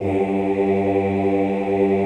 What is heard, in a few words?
OOH G1, Classical Choir; Best Service; Extended Classical Choir